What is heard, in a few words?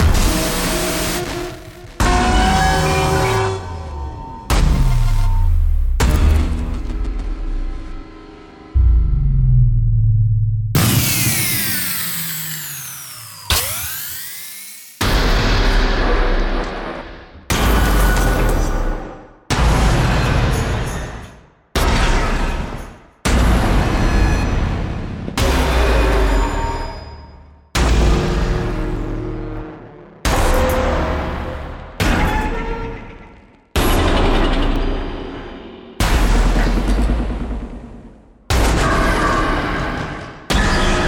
single hits